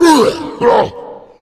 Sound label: tank pain; Left 4 Dead; Source Engine